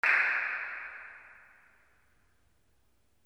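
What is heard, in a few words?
percussion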